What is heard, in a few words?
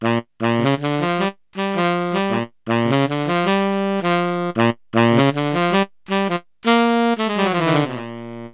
So Sax La; Play&Learn